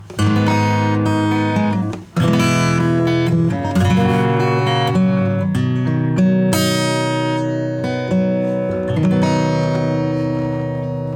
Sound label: acoustic; FETpressor